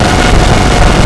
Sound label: chainsaw screech